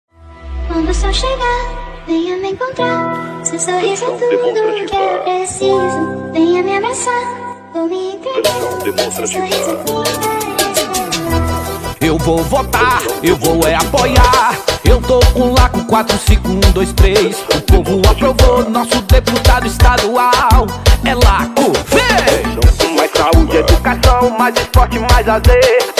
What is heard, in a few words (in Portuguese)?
Paródia Jingle Deputado, Jingles Politicos; quando o sol raiar